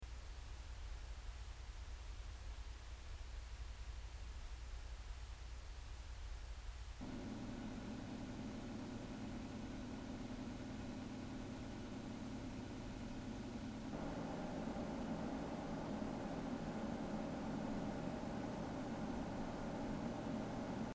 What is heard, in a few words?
corsair 600q idle 40pc load 40pc